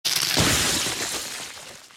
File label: small bird strong attack